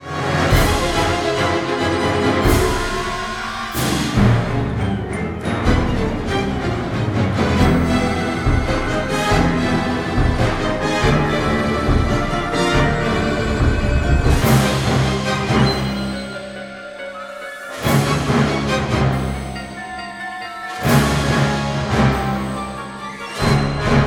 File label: 20th Century Orchestra